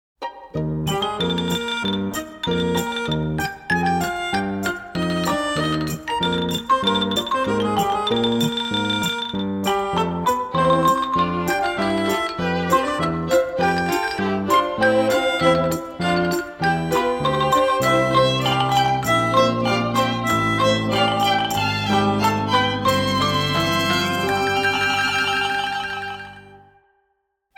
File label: rhythm